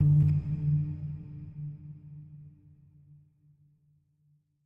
Chime; chime sharp

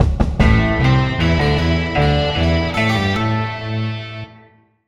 Pickup Powerup; Chasm

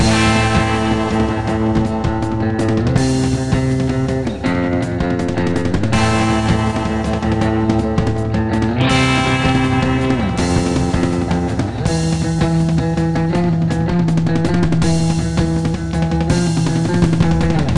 Music Beach; Beach Buggy Blitz; Android

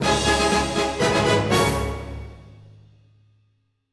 Music Fight Theme Hard 120bpm End; asterix obelix slap them all 2; Romans

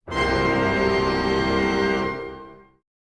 Hit Sting; PS2; PSP

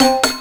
KEY Hold Start

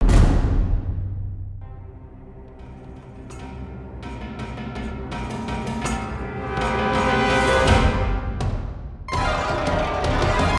Combat Intro; lego universe; Forbidden Valley; Paradox

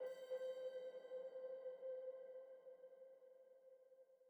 Env Field Night Cave

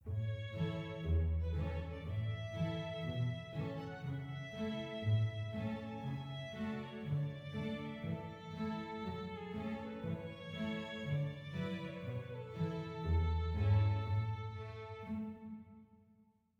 happy soft; Quivr; PS4 Windows gamerip 2016

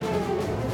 Music Segments Fight Serious Theme Bpm160 Start; asterix obelix slap them all 2

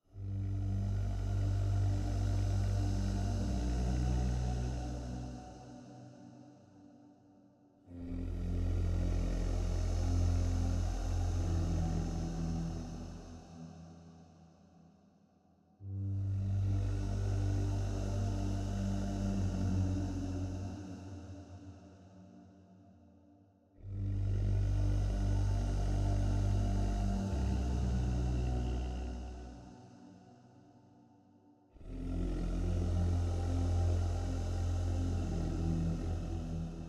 Cave Music, Cave Branch Layer

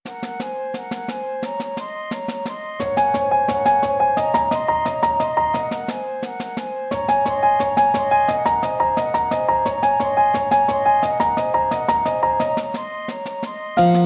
social outing

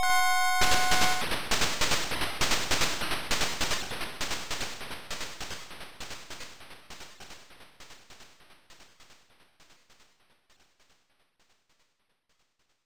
Train; Kujaku Ou 2; family computer

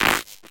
Glitch

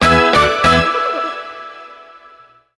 Mission Complete!; The Simpsons Hit & Run; ps2; Wolves Stole My Pills!

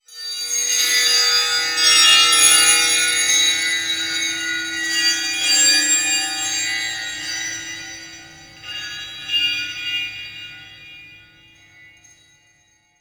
environment temple bells; Aragami

